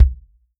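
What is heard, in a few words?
bass drum, Real Drum Kit; REAL DRUMS; Best Service ProSamples vol.43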